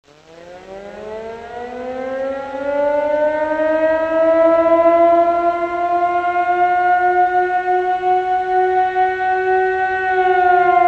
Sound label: air raid